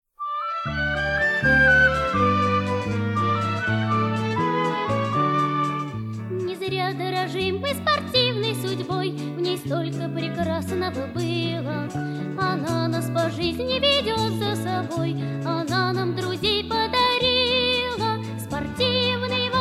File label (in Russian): Bolshoj detskij hor